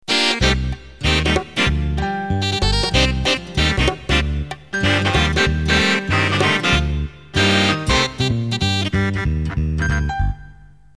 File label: Ballroom Swing Intro